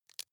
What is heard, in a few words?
wood chip small break creak soft